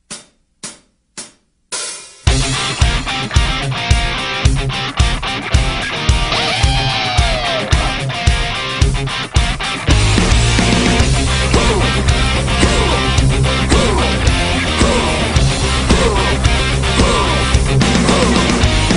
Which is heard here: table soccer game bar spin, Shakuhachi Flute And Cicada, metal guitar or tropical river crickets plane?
metal guitar